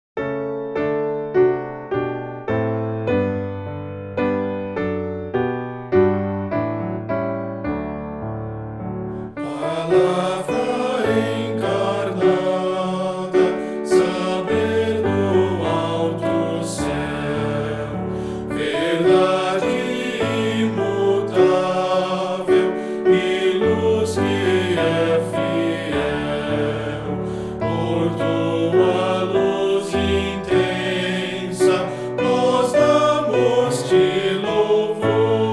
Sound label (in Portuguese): voz piano